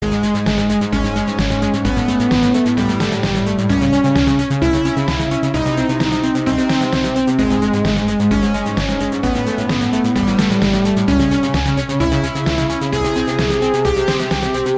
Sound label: Boss Music